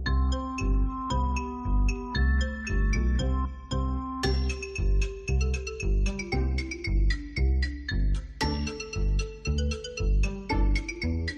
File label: music game